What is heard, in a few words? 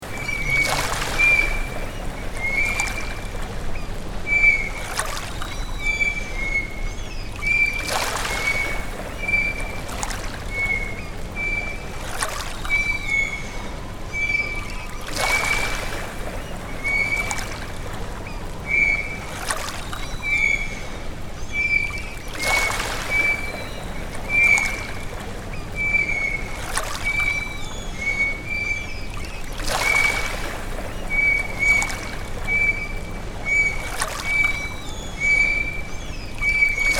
nature